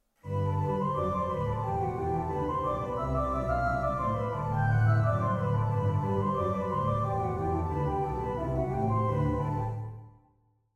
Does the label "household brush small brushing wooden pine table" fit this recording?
No